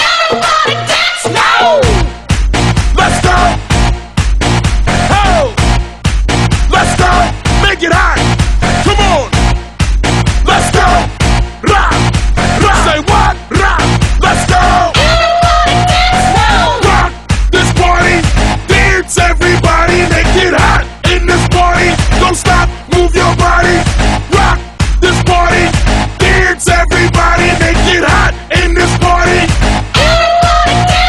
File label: disco